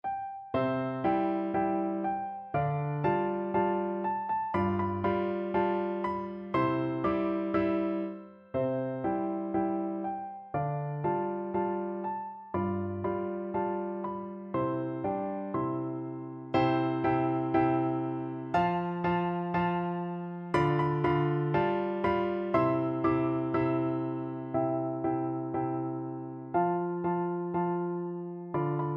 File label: little brown jug, piano duet